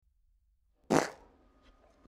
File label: funny fart in classroom